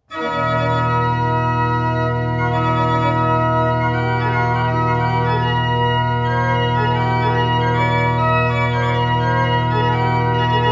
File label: praeambulum super d; ileborgh; instrumentenmuseum